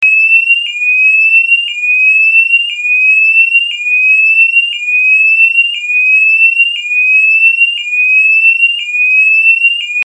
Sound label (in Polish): ton